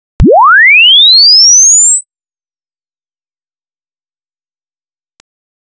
Chirp, chirp signal of 5 seconds with the frequency linearly increasing from 0 to 22050 Hz; Chirp.m; DJ Controller